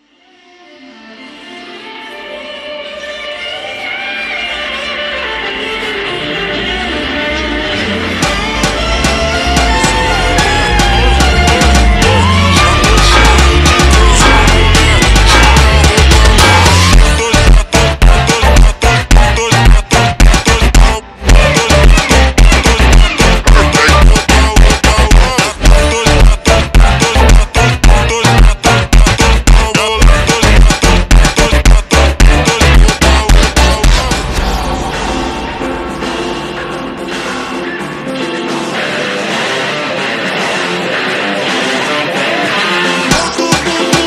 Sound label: funk slowed